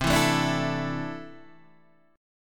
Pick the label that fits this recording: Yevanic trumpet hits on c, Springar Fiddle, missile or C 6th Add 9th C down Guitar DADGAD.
C 6th Add 9th C down Guitar DADGAD